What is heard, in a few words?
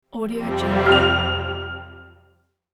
uplifting positive logo jingle